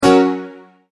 multimedia tone exclamation; Tones; Sound Design; McKinney Sound

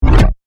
Laser Drop; Lasers

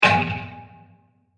Long Metal Impact With Noise; Bursts; Impacts; Sound Effects:Impacts; CraftedSignals

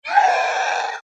horror monster scream; Monster / Creature Scream

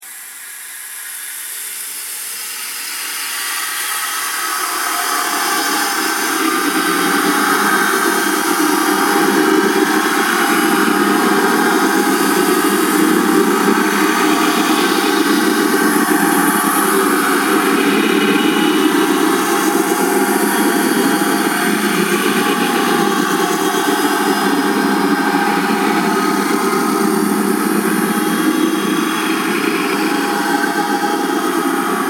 Frightening Drone; Scary Soundscape Sound Effects; Horror Sound Effect

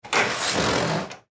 Window Slide Open; Household; Blastwave

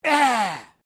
MALE EXCLAIM; Male Vocal sounds; Exclamation Sound Effects; Exclamations (Sound Effects:Voice)

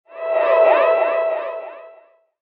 Stolen Souls Cry, ghost sound effect; horror

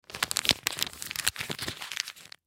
Envelope Crumple; Paper; Office; Blastwave

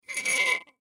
Spigot Turn Rusty, Spigot Turn Rusty Metal Squeak; Squeaks; Household; Blastwave

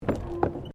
Tram Horse Drawn Step Wooden Bridge

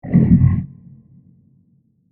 Short Creature Movement Transition; Scary Tones; Horror; Sound Effects:Horror; CraftedSignals

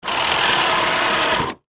Winch Raise Motor, Industry, Machinery; Machines; BlastWave

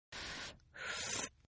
Slurp Female, Drinking; Sound Effects:Human; Partners In Rhyme

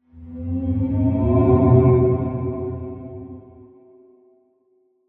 Ghost, horror sound effect; Ghost Sound Effects

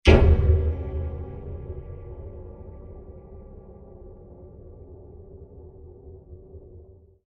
Massiv Metal Impact; Blasts; Impacts; Sound Effects:Impacts; CraftedSignals

